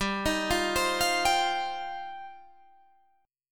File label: G 7th Suspended 4th Sharp 5th; G7sus4#5 arpeggiated